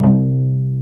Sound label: CELLO PIZZ; Greytsounds VOL-1